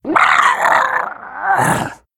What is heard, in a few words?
Murloc Death